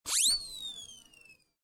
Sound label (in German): heuler